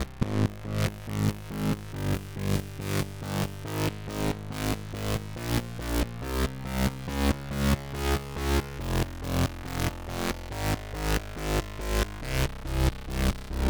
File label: Space Melodic Bass; Bust n Rush